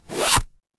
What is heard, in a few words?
paper fabric zipper